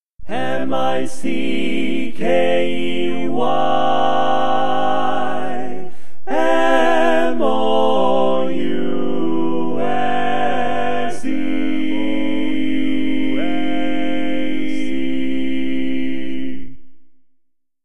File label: All Parts, barbershop tags